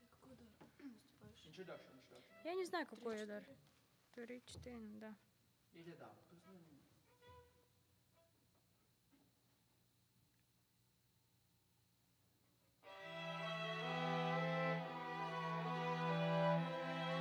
Vocal

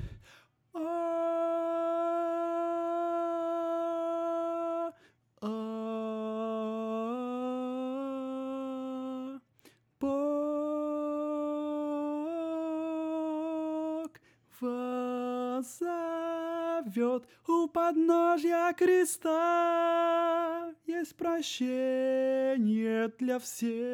tenor

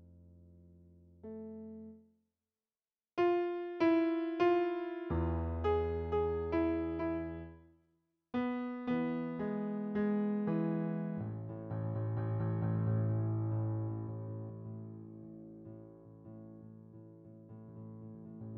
Piano

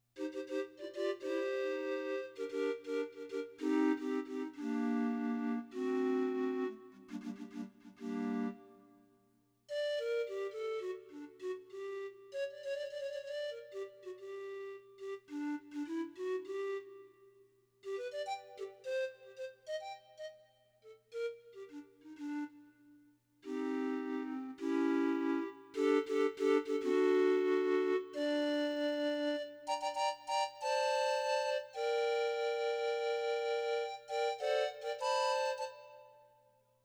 synth

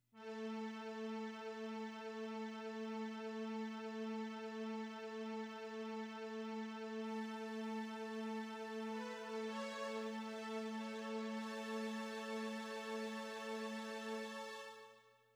pad

